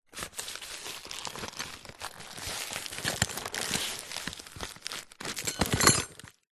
cardboard box small containing metal screws and other pieces hand in remove